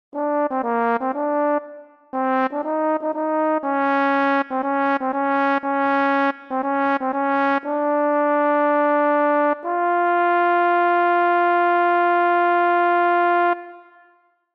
Lead

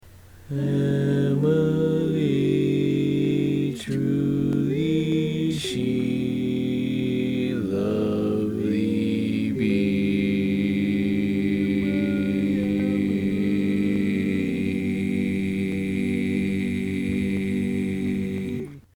Bass, barbershop tags

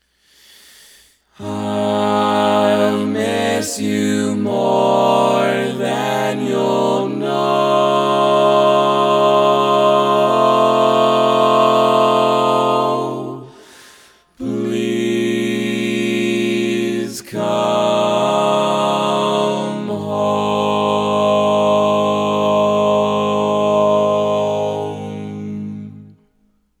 All Parts, barbershop tags

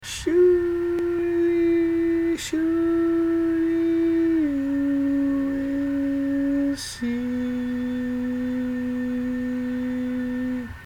barbershop tags

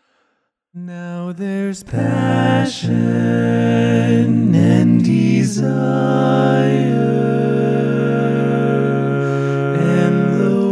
All Parts, barbershop tags

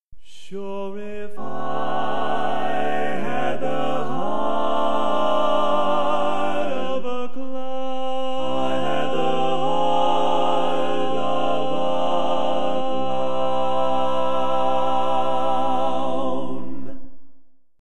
Tenor, barbershop

